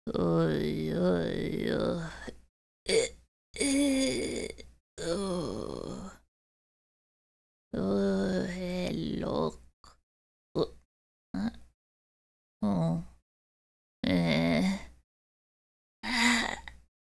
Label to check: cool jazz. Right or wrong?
Wrong